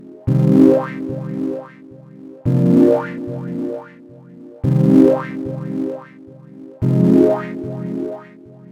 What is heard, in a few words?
synth